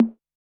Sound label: Electro Kit Tom